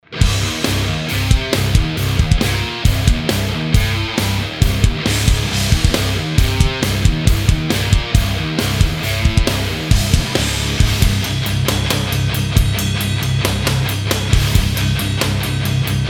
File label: preamp test krank less eq